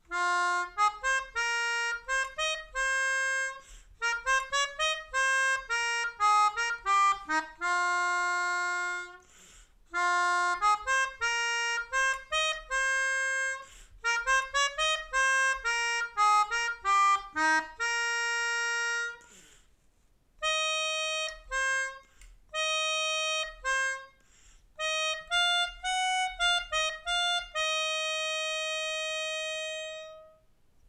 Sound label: Melodica